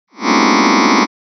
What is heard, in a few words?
cluster closed semi A